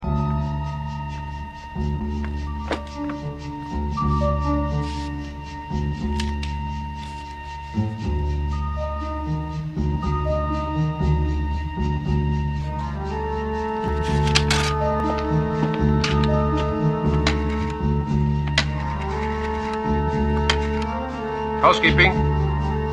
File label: marimba